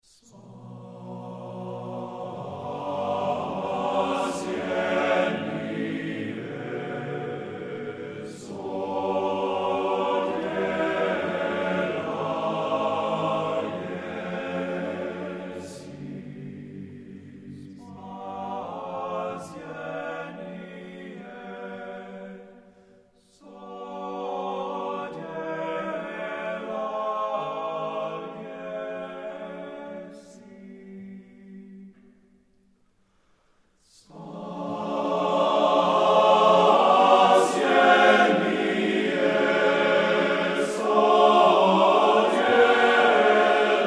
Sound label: Mens Chorus; Spasinye